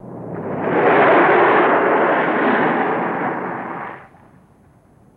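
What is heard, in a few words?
Car Skid In Stop On Dirt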